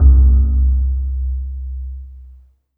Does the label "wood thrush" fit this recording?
No